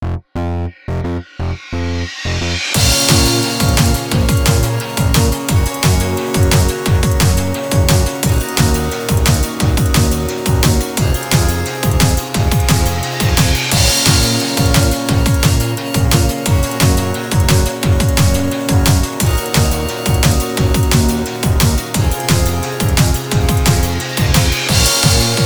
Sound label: Instrumental; Friday Night Funkin Daves Halloween Bash